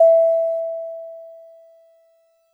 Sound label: DX100 E4; Dream Like